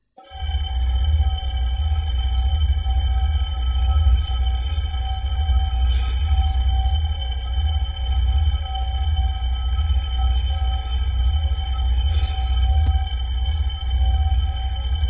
background energy

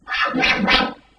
lasso

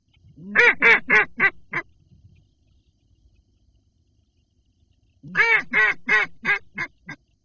Ducks

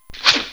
arrow shot

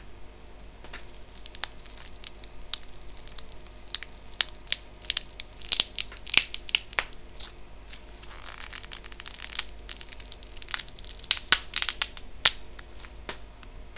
constriction

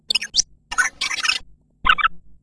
rodent

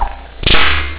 whip slow

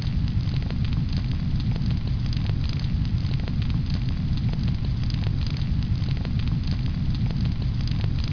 fire

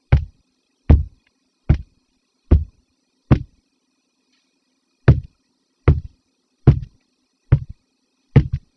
heavy footfalls

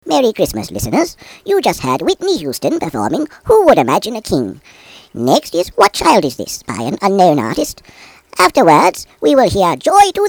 chritmas songs

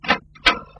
shop door open

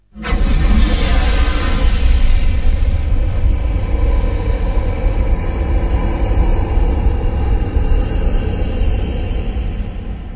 big power whoosh

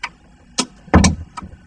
door

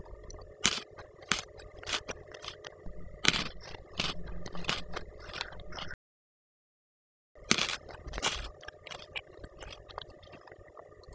candy crunch

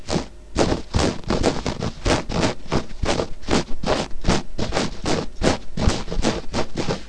scrabbling

